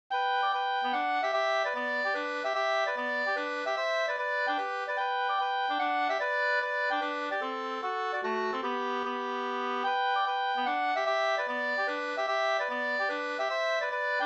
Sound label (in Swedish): gubbstot; e Pelle Fors Og 2st